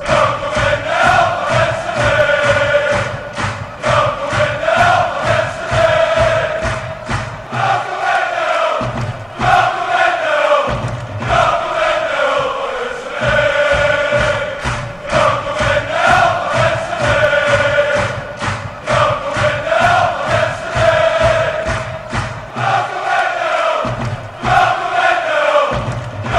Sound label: chant, fanchants; fc twente